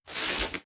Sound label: reality breach spark; Stellaris; nemesis